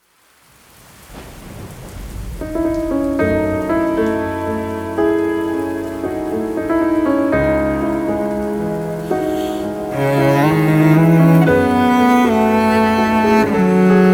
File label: Piano Version